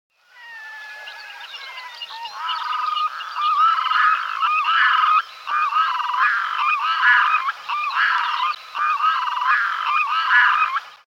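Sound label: red-throated loon voice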